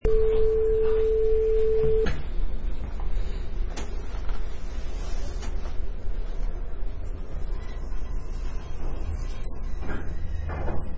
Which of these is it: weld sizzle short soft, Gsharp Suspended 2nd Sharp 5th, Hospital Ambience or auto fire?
Hospital Ambience